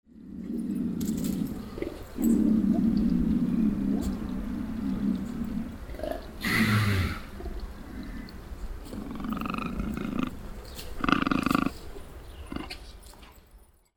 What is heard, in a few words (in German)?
Puma, ZOO; erlebnis zoo